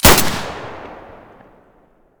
m9a3 muzzle stereo; beretta

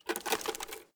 pkm draw

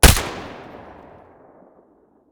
ak105 silencer mono, weapons; STALKER; IWP

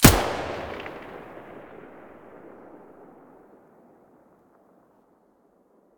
vihr muzzle mono, weapons

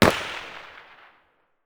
M4A1 muzzle mono far

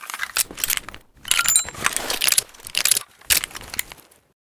taurus reload three, weapons

